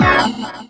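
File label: chord organ, Stabs, House; E Lab; XTc Files of House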